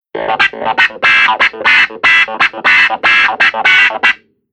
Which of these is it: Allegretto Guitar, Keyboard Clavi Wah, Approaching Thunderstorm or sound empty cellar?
Keyboard Clavi Wah